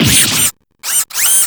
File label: damage; tonate